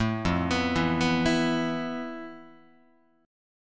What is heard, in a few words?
F Major 7th Flat 5th, Guitar; arpeggiated; JGuitar